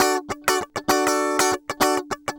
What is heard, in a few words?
100 BPM